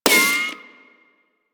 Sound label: Bullet Impact Ricochet; Warframe; Weapons